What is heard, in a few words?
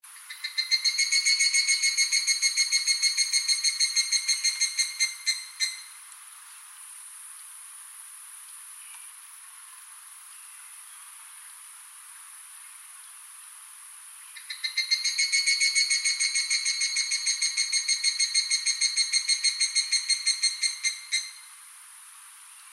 higurashi